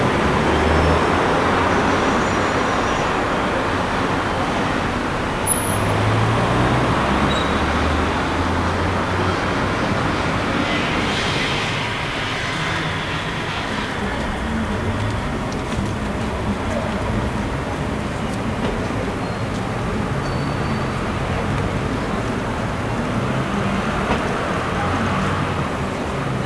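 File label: busy city ambience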